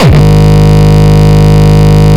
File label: Longkick, kicks